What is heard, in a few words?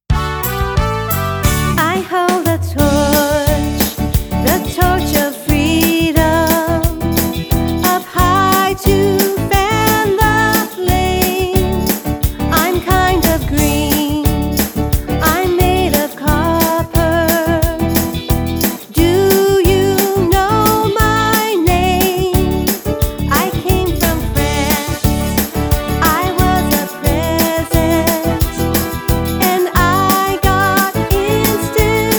statue of liberty vocals